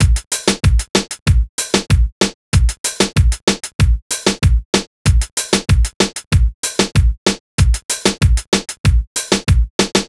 Drums, 095 Cmin

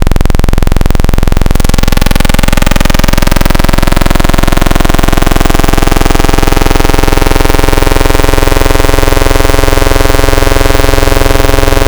Static Shock, Wavetables; Cymatics; Future House Wavetables; Serum

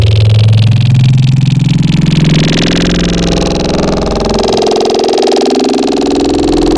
Growl Wavetables; Screwdriver; Cymatics; SERUM; Virus Wavetables

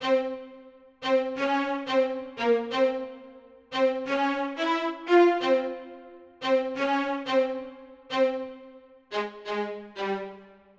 Strings B, 089 Cmin

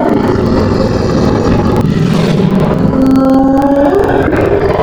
Tiger, Wavetables; Cymatics; Serum; Animals for Serum - Gold Edition

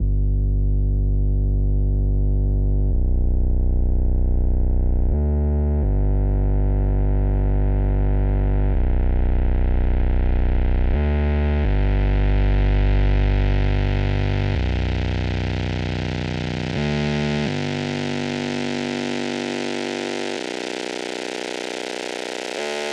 165 BPM B Min Bass; Cymatics; Destiny Gold Expansion Stems; For The Night

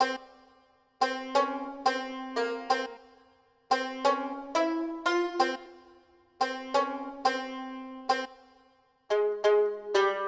Jubus, 089 Cmin; Hip Hop; Hip Hop Exotica; Apple Loops; Big Fish Audio

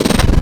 Cat, Wavetables

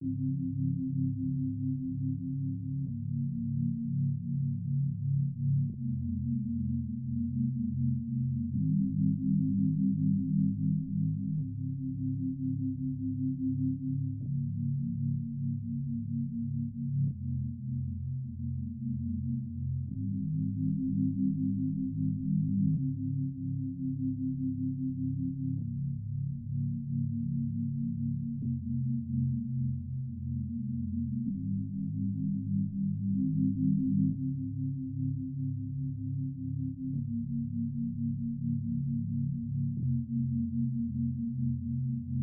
169 BPM F Min Pad; Cymatics; Twilight; Destiny Gold Expansion

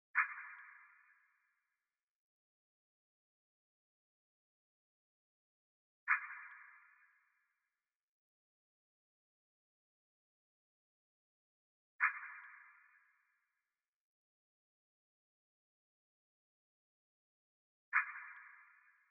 81 BPM A# Min Rimshot; Cymatics; Destiny Gold Expansion; Orchid

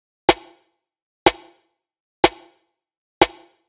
Big EDM Clap Loop, 123 BPM

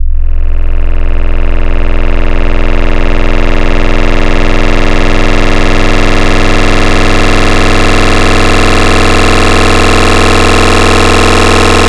Sine To Da Second, FM Wavetables; Cymatics; FM Wavetables Vol 3; Serum

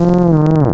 Dolphin, Wavetables; Cymatics; Serum; Animals for Serum - Gold Edition

